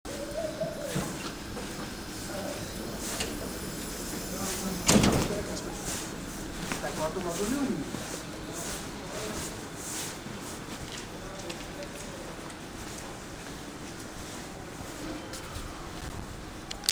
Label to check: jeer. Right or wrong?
Wrong